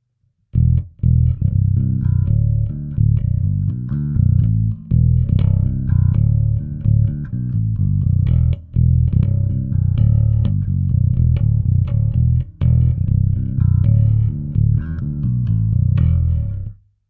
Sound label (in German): bassboost Mikro und DI